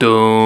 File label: VOX DOON A3, Scats Choir; 90s Sample CDs; Club-50 - Foundations Roland; Short Scats